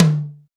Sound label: TOM, Drums; Best Service; Real Mega Drums VOL-1